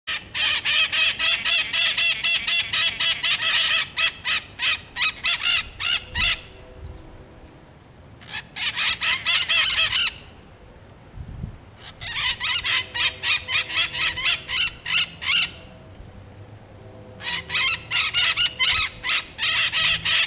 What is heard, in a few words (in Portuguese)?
Maritaca cantando no fio